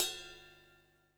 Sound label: RIDE, Drums Acoustic; USB Soundscan vol.10; AKAI; 90s sample CD